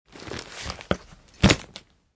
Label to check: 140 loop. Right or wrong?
Wrong